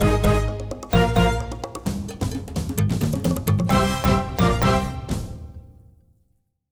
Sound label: Music Jingle Rescue